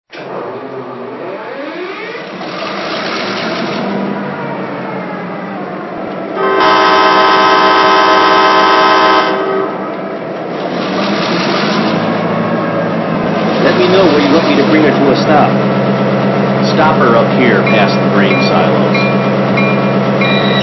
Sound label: SW1500